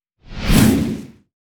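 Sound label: dark matter meteor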